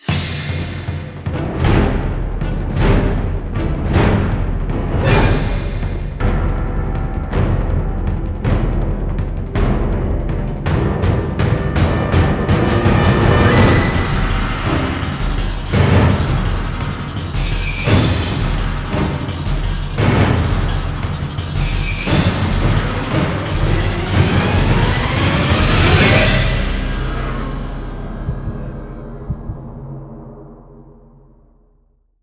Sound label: Music Action Door Kick; battlefield bad company 2